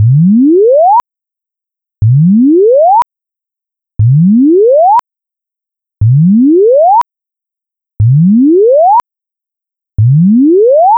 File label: 10Hz to 1000Hz 6 cycle log